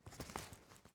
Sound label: Backpack Shuffle